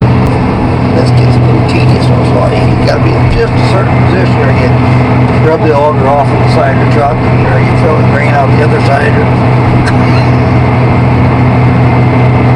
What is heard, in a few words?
Inside Grain Cart Harvest